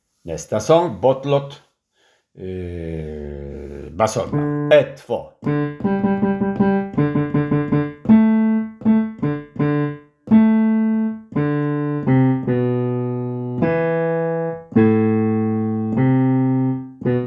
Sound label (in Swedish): bas